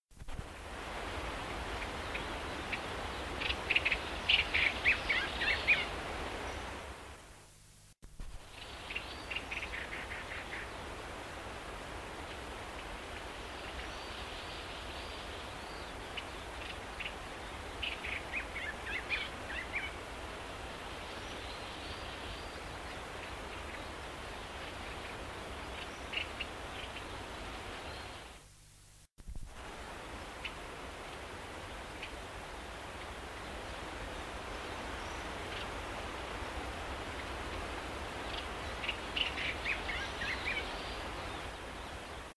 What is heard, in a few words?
Greenbul Cabanis; LAHansen